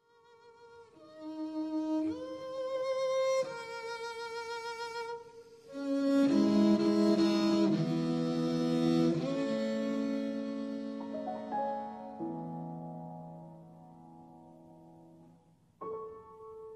Sonata for cello & piano Finale

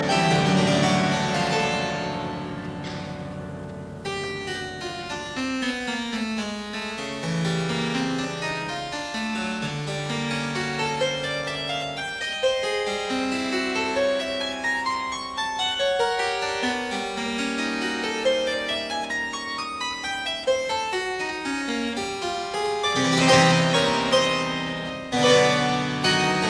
version for keyboard Rondo; Cowell; Set of 4

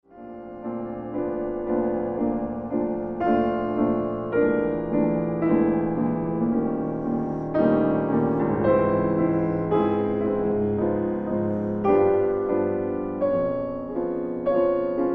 Preludes for piano Andante co anima

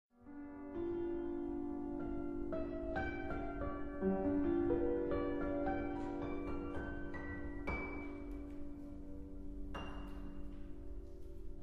Rondo villageois, for piano in C major

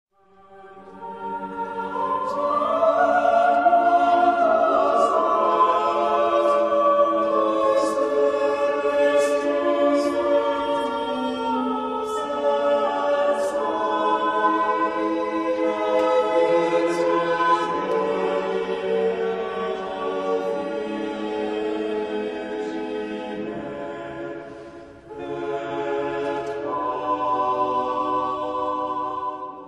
Mass for 4 voices Credo; Certon; 'Sur le Pont D'Avignon'

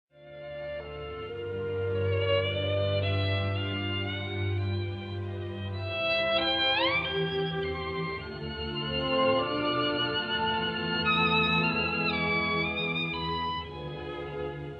Violin Concerto in D major Adagio; Brahms; Op. 77

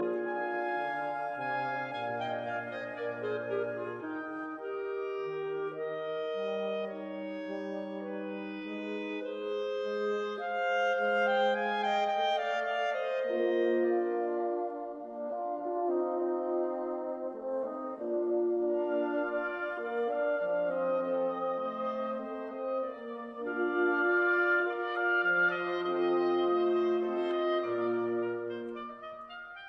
Octet Largo sotto voce cadenza; Octet No 2 in E flat major; cadenza by R Roseman

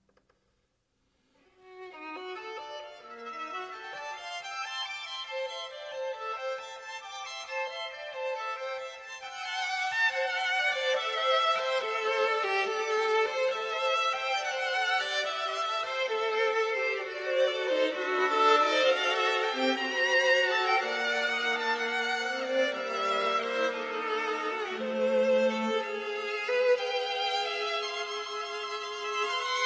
String Quartet; Chihara; Sequoia

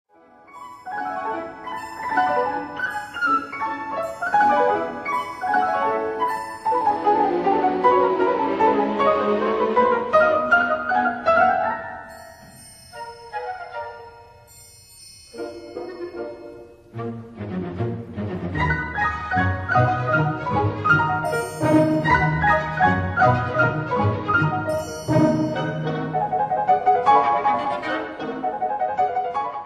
Piano Concerto in E flat major

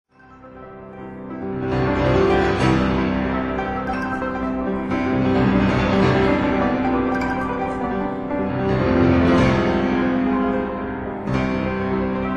Etudes for piano in C minor

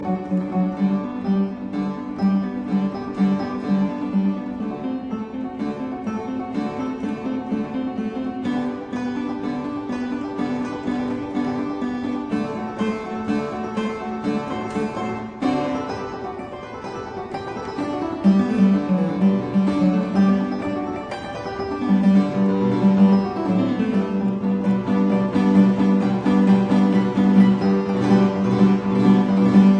Keyboard Sonata in D major; Seixas

